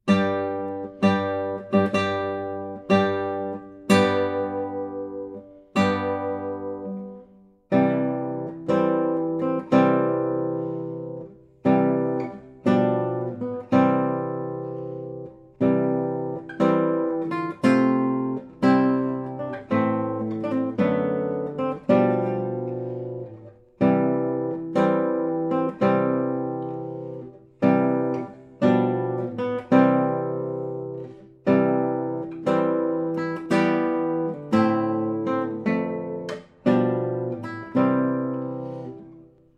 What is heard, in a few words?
Bridal Chorus